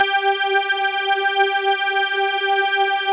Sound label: G-4 PLSWID, PWM; AKAI S-Series CD-ROM Sound Library VOL-7